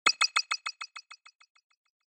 Surprise High Long; Onoma

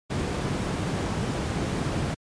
Brown Noise Mono

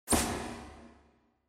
TV Turn Off Reverb

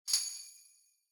Tambourine Hit Body

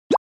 Accent

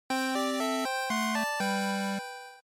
GB RPG Inn

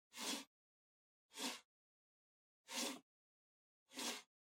PC Mouse Move Far Wood Mid

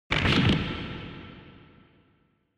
Fracture Reverb

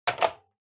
Intercom Handset Replace Mid

